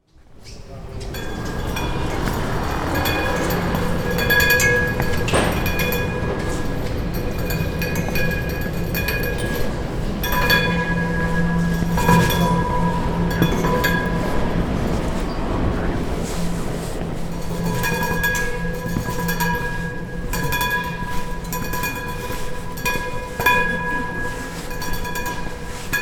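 sound of crossing the fence of the Chino Station passage; 茅野駅通路の柵をこする音; 緑のちょうちょ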